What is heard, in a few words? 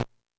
Timbale, E-MU Pro-Cussion